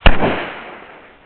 guard fire; wolfstuff; wolf3d

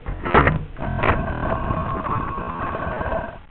push wall; wolfstuff; wolf3d

